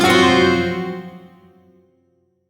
Shawzin Hirajoshi Chord; Music; Warframe; Duviri; Chords

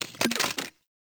Weapon Liberty Launcher Reload; Warframe; Caliber Chicks Minigame; Weapons

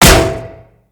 Staff Impact Metal

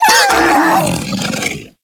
Feral Vocal Death; Warframe; Kubrow

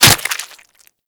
Dagger Flesh Impact; Warframe; Dual Daggers; Hit Effects

